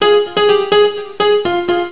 Piano, Cisco Unified IP Phone 7945G

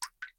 Unlock, phone ui; Gionee; ELife-E7

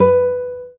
Classic Guitar; Realme 8

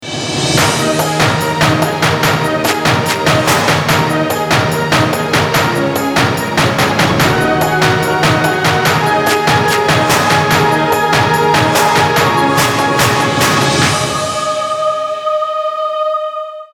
Letterbox Lowcutted; PlusFourSix

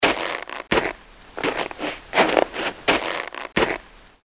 Snow, phonetones Message tones

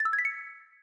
pound, Keytone sounds; GD900 Crystal; Soft

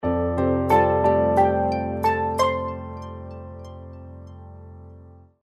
Chinese Power On; Samsung SGH-U800E SoulB

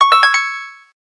harp sharp, keytone; SKY IM-A800S-VEGA-LTE

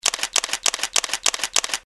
multishot shutter; LG VX11000 enV Touch

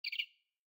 Bird Cicada Notification Night; Xiaomi Redmi-Note-8-Pro

